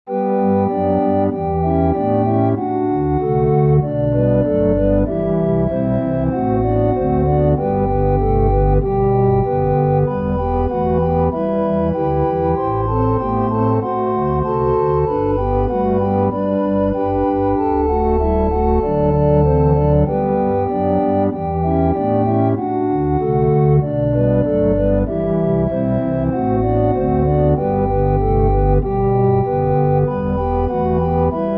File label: prelude for pipe organ; Johann Sebastian Bach; alle menschen ssen sterben